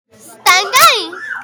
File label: girl sound